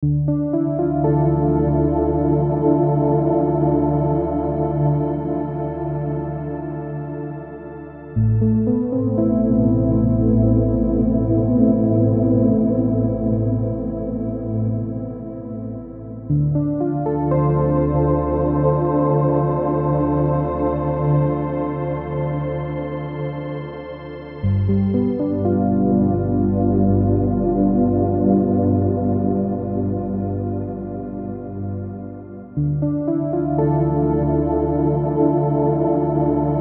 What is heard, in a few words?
Dreamy ambient background music loop